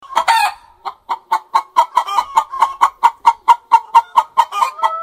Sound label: hen